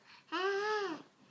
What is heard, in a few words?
aaah